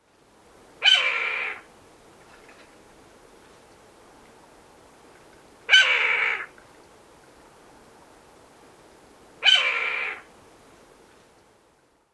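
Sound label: Steller's Jay red-tailed-hawk call; bird language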